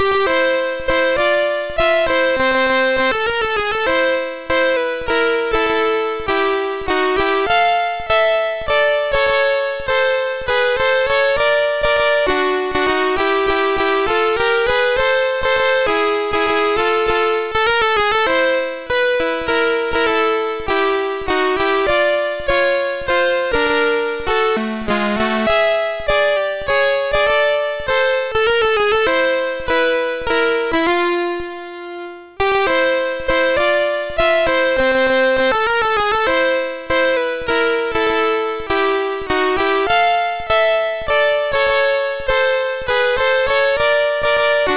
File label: Merry Widow Waltz, MOS6581R3